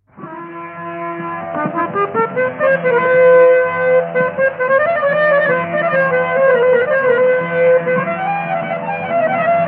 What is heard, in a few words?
BHAIRAVI, Harmonium; Sri Aurobindo Ashram